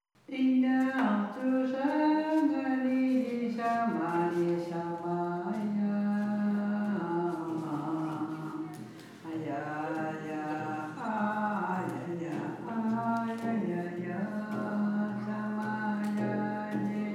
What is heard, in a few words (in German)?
gesang des lichts inuit